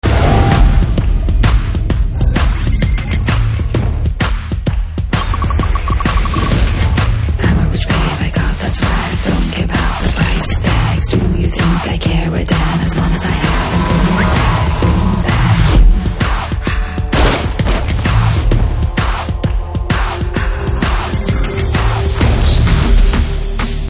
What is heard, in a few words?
Scream of Darkness Theme Ringtone; Vishals Chakra